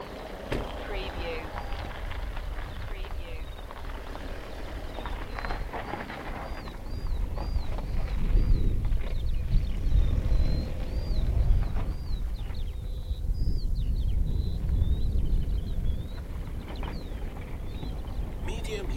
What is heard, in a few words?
Diesel vehicle driving away; beanstalk audio